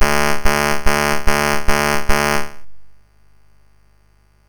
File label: Project Firestart, MOS6581R4